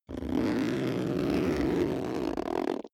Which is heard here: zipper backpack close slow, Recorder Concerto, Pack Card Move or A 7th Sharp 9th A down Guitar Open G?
zipper backpack close slow